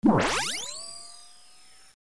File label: noise; spectrobes2